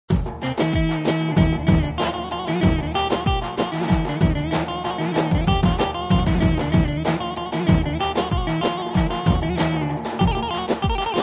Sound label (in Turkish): elektro; a2000